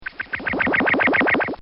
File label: Electronic Pulse